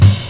old school natural kick drum single hit g key, Royality free kick sound